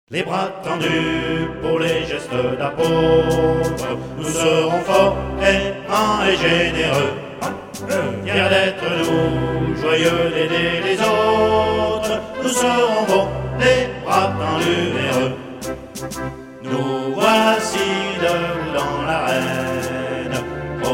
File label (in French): Chant federal